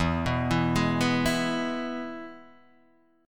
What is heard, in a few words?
E-Major E; arpeggiated; JGuitar